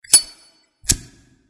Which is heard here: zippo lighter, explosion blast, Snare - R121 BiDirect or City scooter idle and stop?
zippo lighter